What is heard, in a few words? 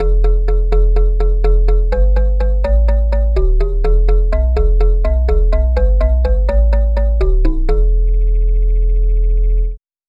vibraphone bass